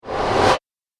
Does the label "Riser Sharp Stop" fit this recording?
Yes